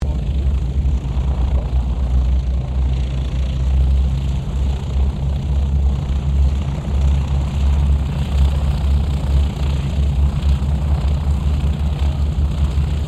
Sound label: 1945 DOUGLAS DC3 TAXI SHUTDOWN; 1945 DC commercial aircraft; Airplanes; Vehicles; Aircraft Collection; Twistedtracks